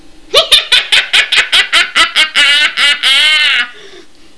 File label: cackle, halloween